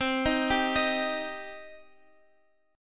C Minor C down

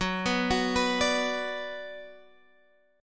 Gb Suspended 4th Sharp 5th, Guitar, Drop D; x,9,9,11,12,10; down; chord shape

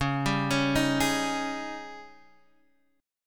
Ab Major 7th Suspended 4th Db; arpeggiated

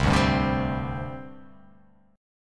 C Suspended 2nd, C, down, chord; Csus2 strummed

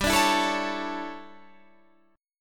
D Major 7th Suspended 2nd Suspended 4th

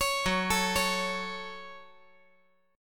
F sharp Minor, guitar; chordshape; down

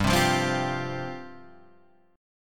G 7th Suspended 4th G down Guitar Half Step Down

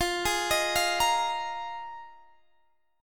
A sharp 7th Suspended 4th F, Guitar

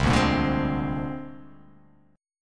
C 7th Suspended 2nd Sharp 5th, C7sus2#5 chord; C7sus2#5 strummed; JGuitar

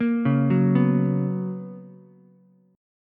B Major 7th Suspended 2nd Ukulele, chord; BM7sus2; arpeggiated; JGuitar

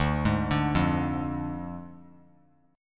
D Diminished D; arpeggiated

